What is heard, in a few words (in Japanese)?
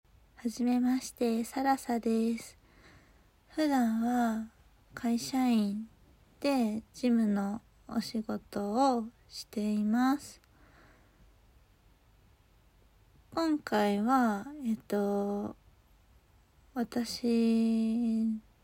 ガチ排便